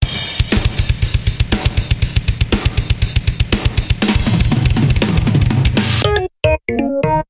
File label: Funk Rapids Rock Bumper Reversed